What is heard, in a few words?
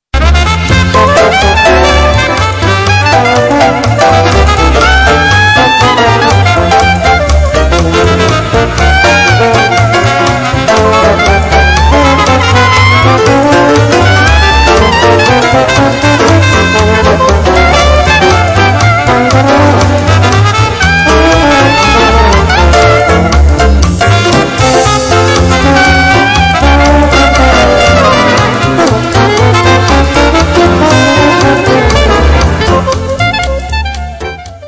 dixieland